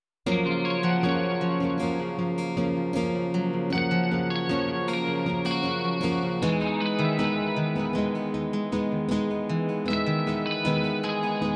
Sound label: Karaoke